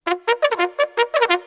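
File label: bugle toot; Musical Instruments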